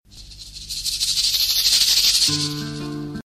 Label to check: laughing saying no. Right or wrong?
Wrong